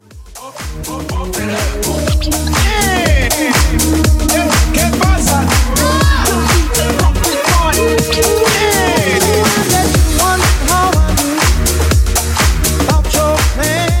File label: Old School Classic House Mix